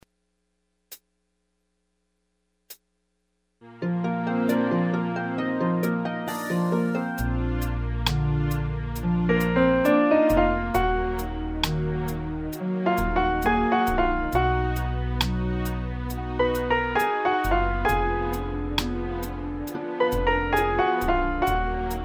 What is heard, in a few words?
piano